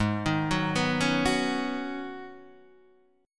G sharp Minor 11th G sharp Guitar DADGAD, chord

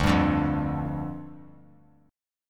D Minor Double Flat 5th, down; Dmbb5; strummed; JGuitar

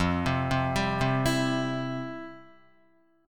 F Major 7th Suspended 2nd, Guitar; arpeggiated; chordshape

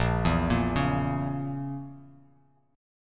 A sharp Diminished; arpeggiated; JGuitar

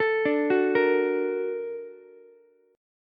Bb Augmented Major 7th, Ukulele, chord; Bb+M7 arpeggiated; JGuitar

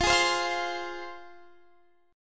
F Minor, F, Guitar, Standard; strummed; x,20,18,17,18,20; JGuitar

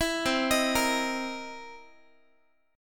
C 7th Sharp 9th, Guitar; C7#9; arpeggiated; 19,20,18,20,x,x; 4A,4E,5A,5D,5G#,6C#

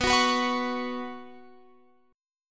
B Suspended 2nd B Guitar Open F; Bsus2; strummed

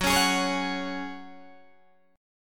F sharp Suspended 2nd, Guitar, DADGAD; down

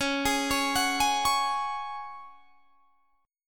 C sharp Suspended 4th C sharp down, chord; arpeggiated

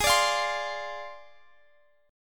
D-Minor 7th Flat 5th, Ab

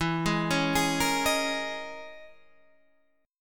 Db Minor 6th Add 9th E

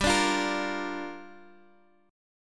Bb Major G; Bb/G strummed; JGuitar

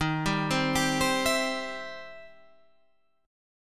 E Minor Major 7th Sharp 5th Eb

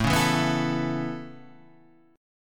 A Major Add 9th A, chord; Aadd9 Chord {5 4 2 4 5 5} strummed

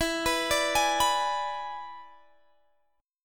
E 7th E down Guitar Drop D

